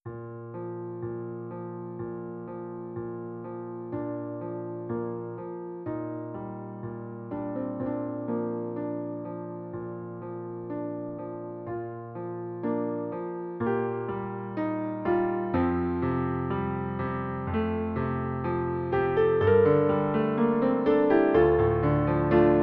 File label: Wedding Day piano